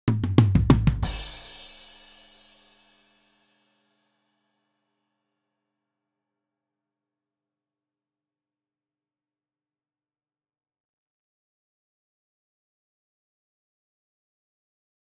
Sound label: Sirens